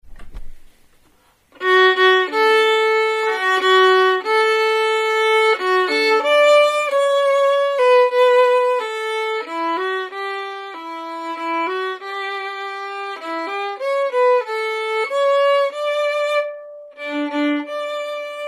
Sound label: Brahms Lullaby Melody, violin; duets for violin only